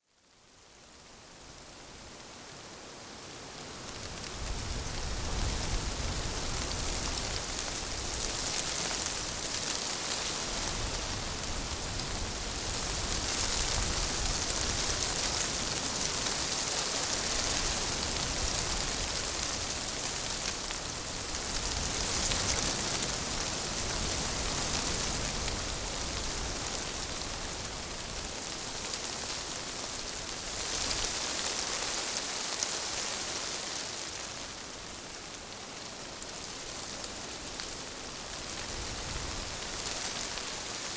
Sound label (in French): vent feuillage tremble